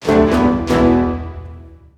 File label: Event Battle Start; SandBox Musics